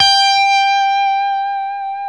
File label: LES PAUL G 5; Spectrasonics; Hans Zimmer Guitars VOL-1